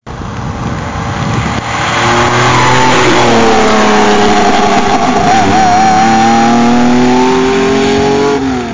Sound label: lamborghini diablo GT